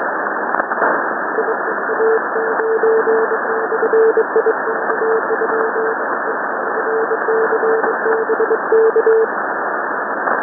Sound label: sending random prefixes